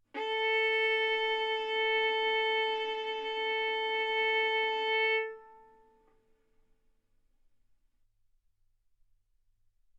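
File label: Violin arco mf A string A4 B4 stereo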